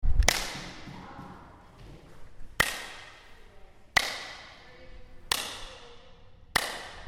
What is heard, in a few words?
clap